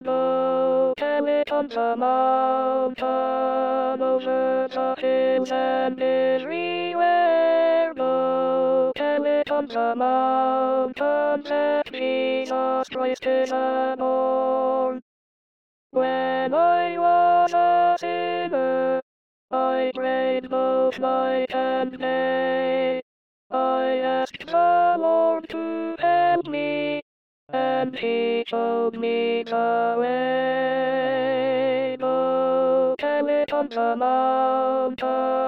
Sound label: Go tell altos; go tell it on the mountain